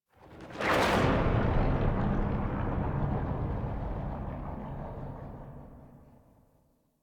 Ship By; Warframe; The New War; Zariman; Apartment

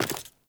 Sprout Farmer Wood Step, Steps; Characters; Ambient; Sprout Farmers

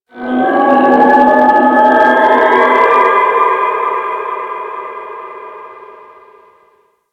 Ocean Dolphin; Warframe; Grineer Ocean

